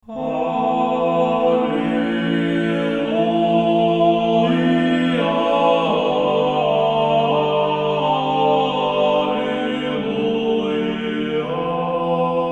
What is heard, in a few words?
ensemble of old church music alleluia; ensemble of old church music sretenye